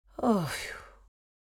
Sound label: Mature woman relaxed moan